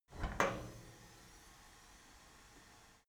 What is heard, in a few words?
cleaning machine open handle